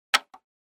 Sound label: Button